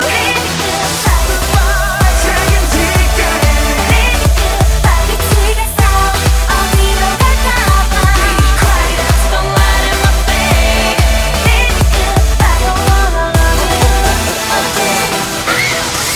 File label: k pop mashup